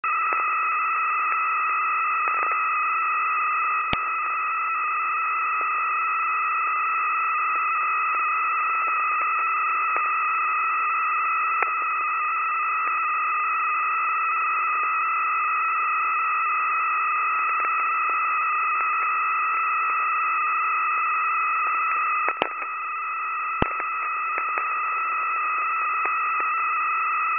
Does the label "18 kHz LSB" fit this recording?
Yes